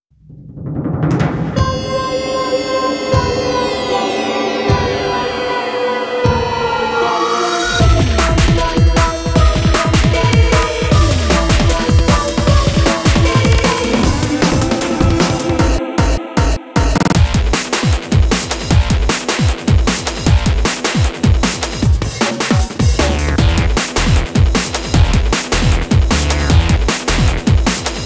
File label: Opening Rap Version Instrumental; Mystic Force